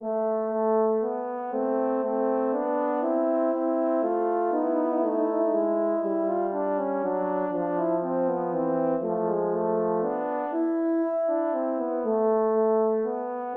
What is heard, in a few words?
Minuet in E minor, 2 horns